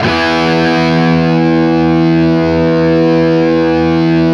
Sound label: 5TH E looped, Guitar; East West; Steve Stevens Guitar Samples Collection